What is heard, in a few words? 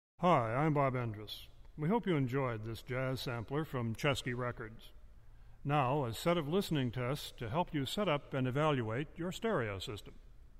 Jazz Left-Right Imaging Test